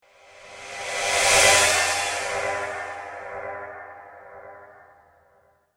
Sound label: Captured Fear Transition; Transition Sound Effects